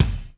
BASS, DRUM MACHINES, Alesis HR16

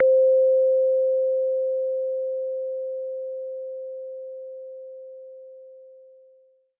bell instrument note 48; Multisampled Bell Instrument

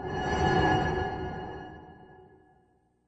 spooky piano hit; Electro and Synthetic

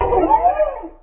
alien sneezes in tunnel; Weird and Interesting Hits; single hits

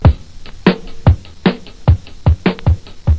lofi basic groove, drum loops and breaks, 075 bpm; 000 to 080 bpm

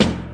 snare

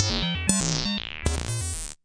ufo random warbler

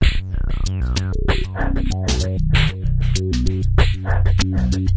resonate bass low drums, 96 bpm; 091 to 100 bpm

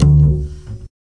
Upright Bass Notes

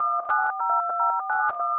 mystery phone tones loop

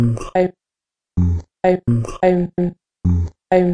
128 vocal blip loop; Ze Franks Pain Pack

